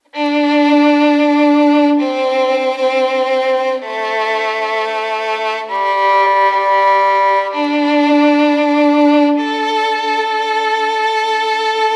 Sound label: Violins - Dm 130Bpm; Construction Kits; STEMS; Ghosthack; SkyRealm; Kit 24 - Dragon Mountain